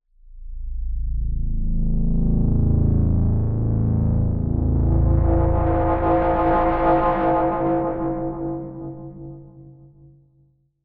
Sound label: Pad, Sylenth1; Routed Sound; Sylenth1 Expansion - Doom Carpenter Vol.1